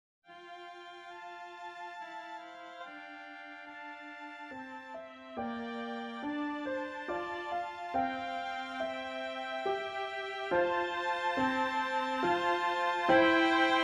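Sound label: high accompaniment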